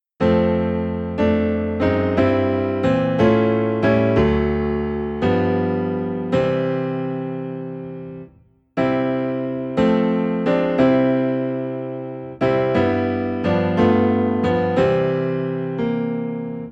Piano Low in C

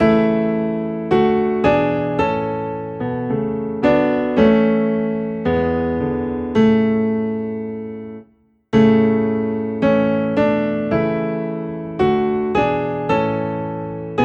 Piano Low in A; Jesus Shall Reign

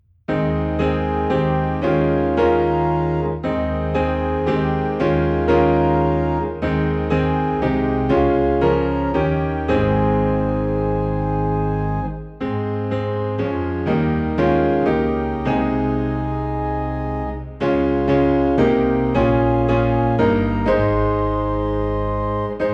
Piano and Organ in A Flat

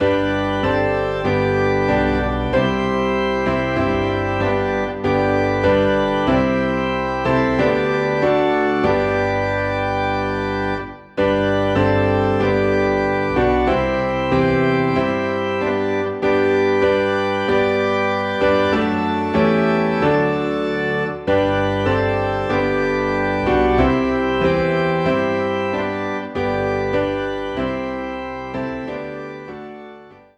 Piano and Organ in G; Tis So Sweet to Trust in Jesus; Organ and piano played together.

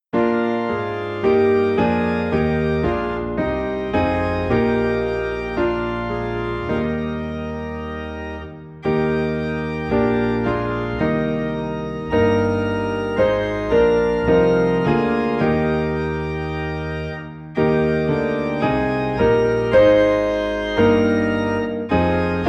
Piano and Organ in E Flat; Abide With Me

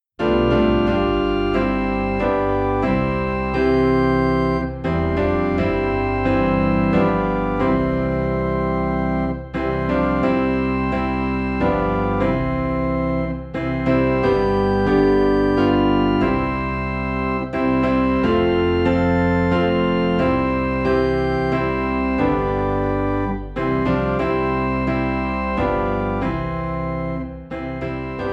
Piano and Organ Low in C; Trust and Obey

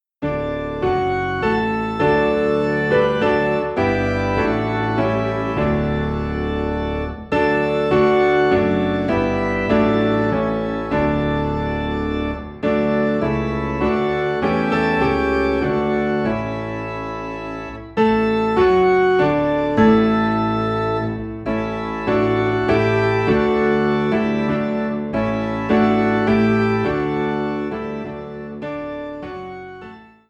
Piano and Organ Low in D; Come Thou Almighty King

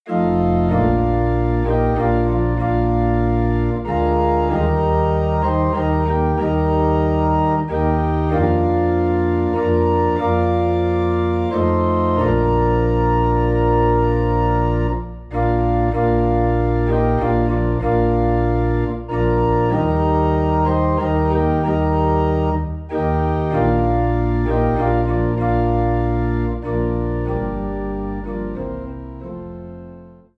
Organ in B Flat; The Comforter Has Come

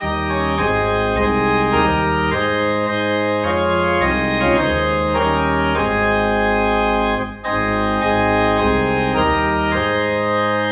Organ in A Flat

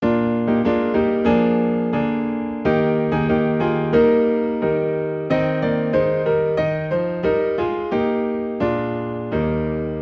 Piano in E Flat; christmas; O Thou Joyful O Thou Wonderful

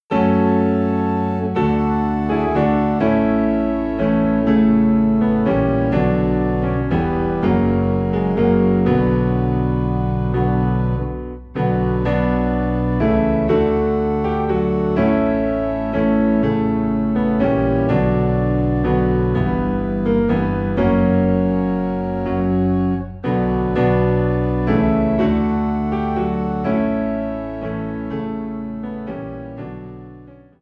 Piano and Organ Low in B Minor; christmas; What Child Is This

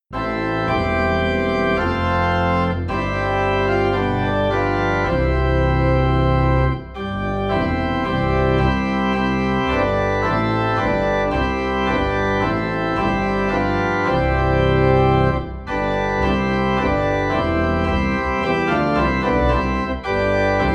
Organ Low in C; All Hail the Power

